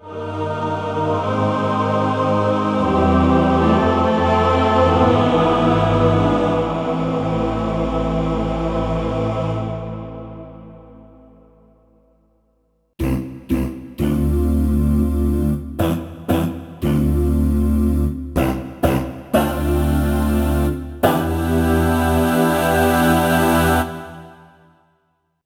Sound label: choirs; kurzweil pc361